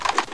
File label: equip; BaboViolent 2